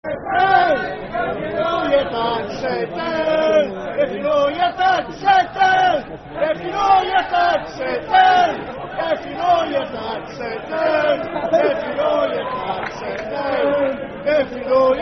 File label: Sit Down If You Know Yer Dad [Football Chant (Soccer Song)], St Mirren; St Mirren Smfc